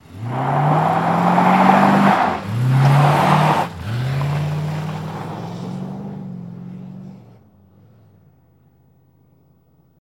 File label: Cadillac 72 Peel Out; Cadillac 1972